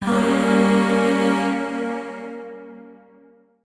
Void Shawzin Phrygian Chord; Warframe; Zariman; Chords

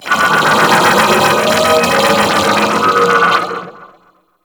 Vocal Idle; Warframe; Amalgam Override

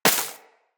Bullet Impact Debris Snow; Weapons

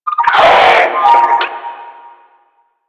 Death; Warframe; Lasrian; Lasrian Enemy B

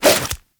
nunchuck end whoosh; Warframe; Infested; weapons

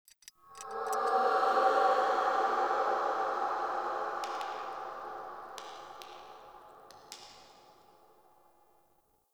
Helmet Idle; Friendly; Tuvalkane; Discovery

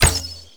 Daikyu Prime Quick Fire Shot D; Tenno; Daikyu Bow

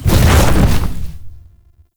Horse Glide Wings Retract; Warframe; Duviri Horse; Vehicles

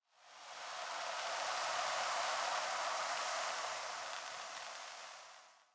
Sand Gust; Warframe; Clan Dojo; Dojo Randoms; Ambience

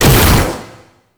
Taller Door Open Mech; Warframe; Entrati

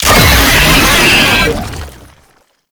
Proto Infested Brachiolyst Death, Vocals, Enemies; Warframe

